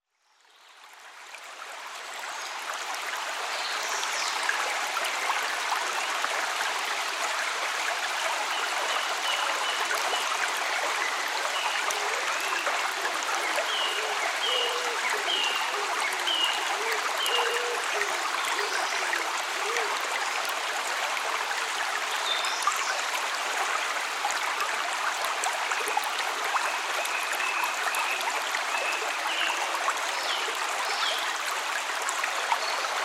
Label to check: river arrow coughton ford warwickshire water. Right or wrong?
Right